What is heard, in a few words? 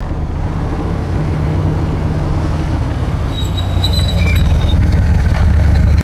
vintage suv stopping with sharp brake squeak; vehicles